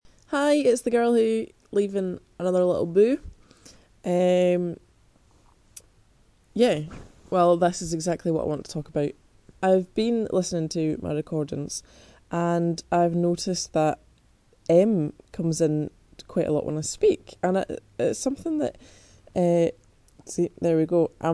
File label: umming ahhing